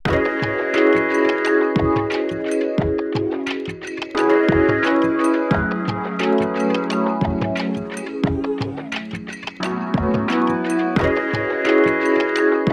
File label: 88 BPM C# Min, Vintage & RnB Melodies; Promises; Cymatics